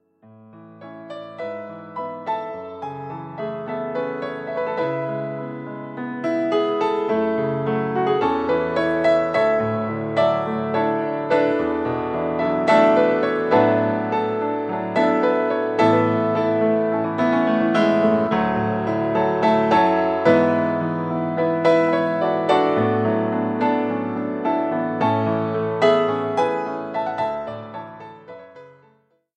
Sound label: instrumental